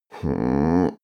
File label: male grumble sad; menschen; koerpergeraeusche; soundpool